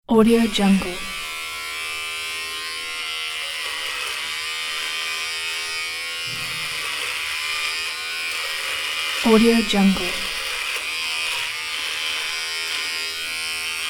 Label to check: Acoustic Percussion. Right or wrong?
Wrong